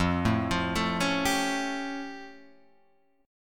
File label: A Augmented 7th F